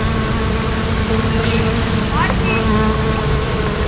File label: zvok cebel bee sound